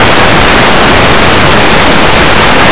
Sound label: drawer